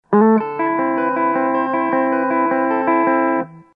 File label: guitar